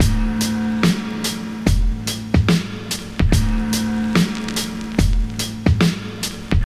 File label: 72 bpm 2000s breakbeat d key, Free breakbeat - kick tuned to the D note. Loudest frequency: 1240Hz